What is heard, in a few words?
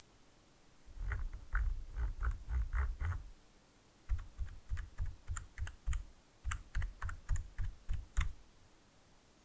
Scroll Mediakeys